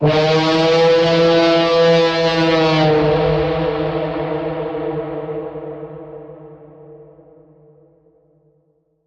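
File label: creature; Electric Despair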